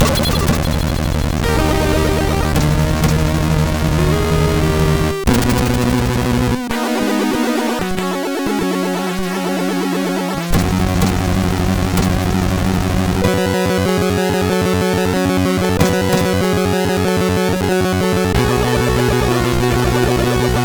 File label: Arabian, sid CSG8580R5; hvsc